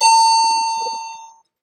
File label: chime up, elevator; elevatorparts